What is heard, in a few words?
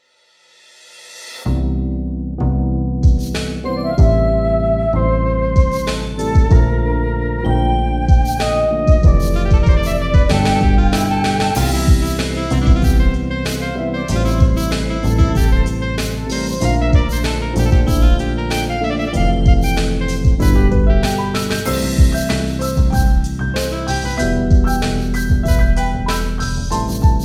Night Jazz Mix